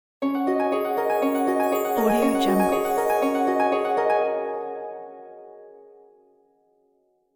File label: harp charm